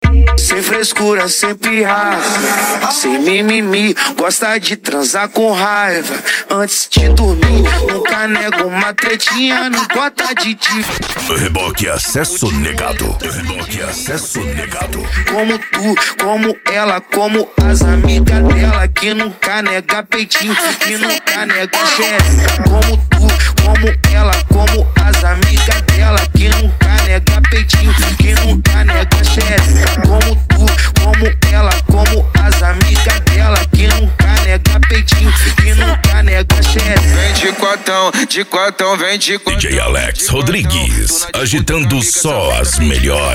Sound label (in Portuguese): racha eletronica e funk mega bass